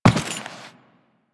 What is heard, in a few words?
footstep; Step Default, Footsteps; Warframe; Teshin